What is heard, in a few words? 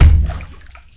Dunk